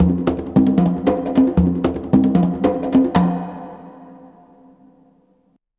CONGA BEAT; Zero G - Ethnic; CONGA BEATS

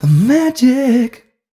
m 128 mel b-flat, Vocals

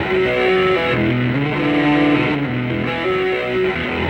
Hum Doodle, Electric Guitar; Sonic Foundry (Sony Creative Software); Friendly Fire; Wrath

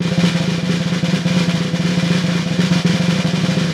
CR, vintage snare drum; Q Up Arts; Johnny.C's Vintage Snare Drum

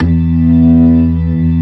ORG VK, B-3 ORGANS Vinta Keys B3

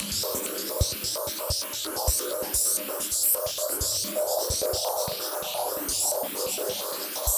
Moving Noise 130, Tempo Loops 130bpm

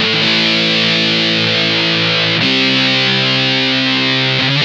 BIG CHORD, Funk Guitar; Zero G - Funk Guitar

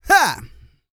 GRUNT, MALE HUMAN; Spectrasonics Vocal Planet

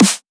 artcore snare

